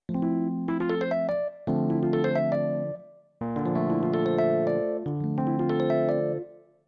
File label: major, jazz piano